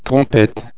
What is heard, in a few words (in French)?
trompette